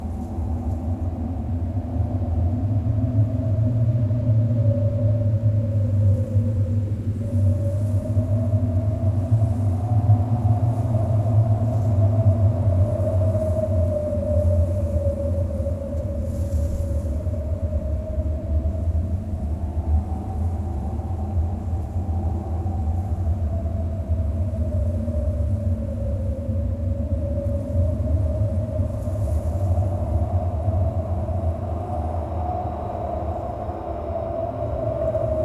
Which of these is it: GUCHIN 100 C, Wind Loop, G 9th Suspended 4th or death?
Wind Loop